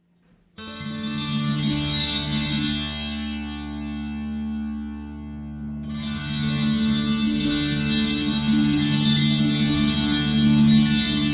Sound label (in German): Körpertambura flach, Stimmung a/d’/d’/d (D), dynamische Spielweise; Körpertambura® (Body Tambura)